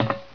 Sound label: pl metal; player